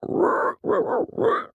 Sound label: pig long